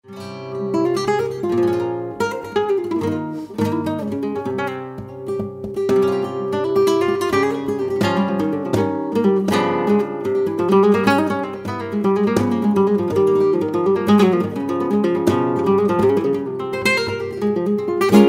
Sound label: Flamenco Guitar